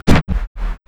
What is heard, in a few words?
FX REVERSE